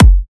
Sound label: dry bass drum sound f sharp key